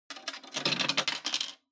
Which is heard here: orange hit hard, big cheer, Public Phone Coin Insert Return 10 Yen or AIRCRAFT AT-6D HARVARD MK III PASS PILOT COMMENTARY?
Public Phone Coin Insert Return 10 Yen